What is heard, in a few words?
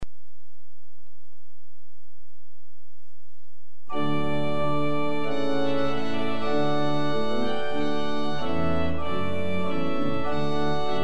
trumpet voluntary in d